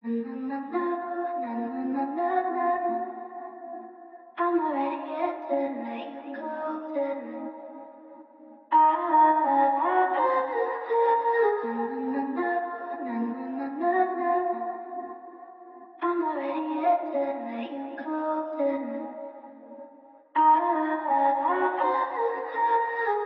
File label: Vocal Phrase, 165 BPM A# Min; Already Here; Cymatics; Infinity Vocal Collection